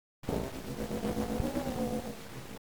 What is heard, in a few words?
gong